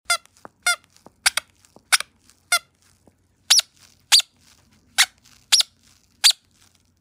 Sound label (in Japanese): nakibue